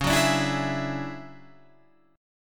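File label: D Major Sharp 11th D flat, chord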